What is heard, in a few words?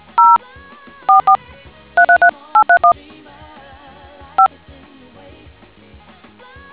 dtmf